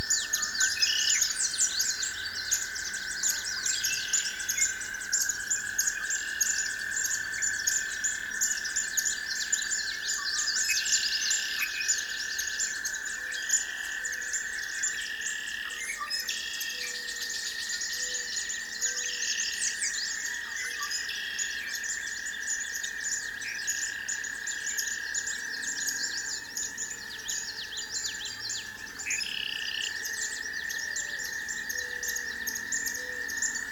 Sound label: Wetland Pond Animals